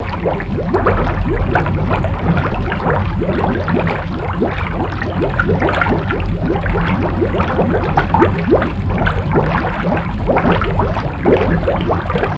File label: Bubbling Deep Liqui